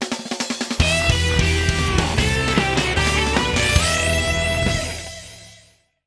Victory Fanfare; F-Zero; Super Smash Bros. Brawl

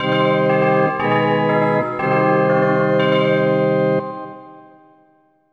Church Door Opens; Banjo Kazooie

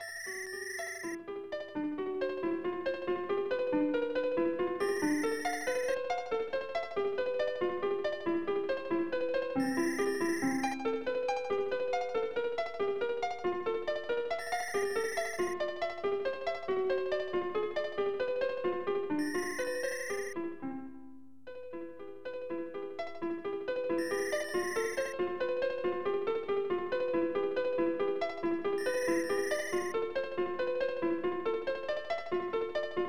Melody of Lute with Crickets; Final Fantasy; PS1 + PS2

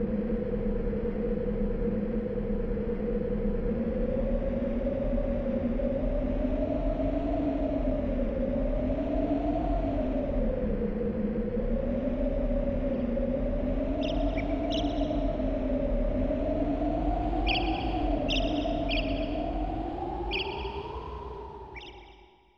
SFX Logo (without Music); Banjo Kazooie; Rare & Nintendo

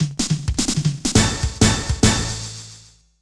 Game Over; Super Adventure Island [SNES]

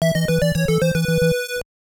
Death; CastleVania Series; CastleVania 1

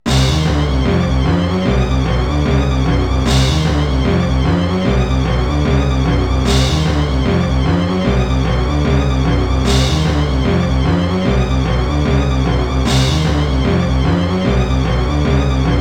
Crystal Caves Earthquake; Donkey Kong 64

